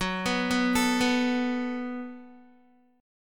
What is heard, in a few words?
Gb Minor Double Flat 5th, Guitar; arpeggiated; 13,14,11,14,11,x; 4F,4A,5C,5G,5C,6E